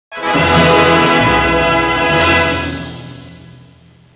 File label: fanfare